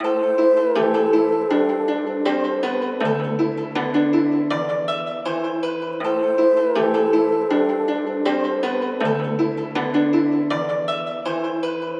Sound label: Nostalgic Melody Loop - 160 BPM A Min; Cymatics; 2020 Melody Collection